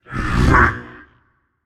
Grunt Lift; smite; voicepack; jorm

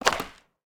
skateboard tailpop; smite; loki

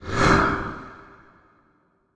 fire; smite; hades

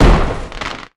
Death Bodyfall; smite; kumbhakarna

